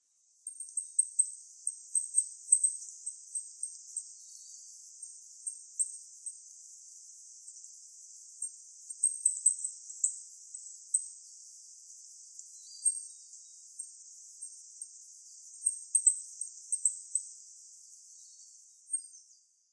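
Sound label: Grey Bunting; kuroji; utsunomiya; koe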